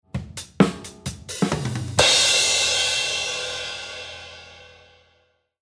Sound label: EQ Filter Before